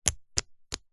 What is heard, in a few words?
foley wood blocks small x3 stack slow